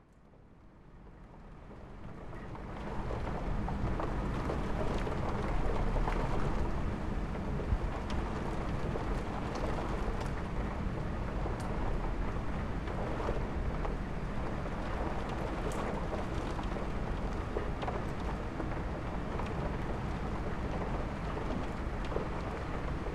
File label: room ambience